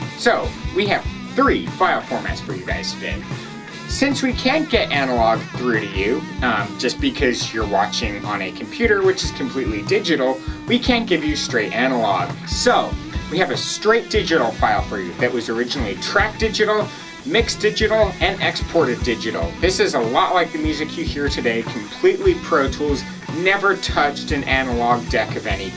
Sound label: vtm vs real tape mix